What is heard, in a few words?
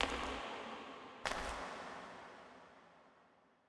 Steps Far, Foley; Ballas; Warframe; Cinematics; Apostasy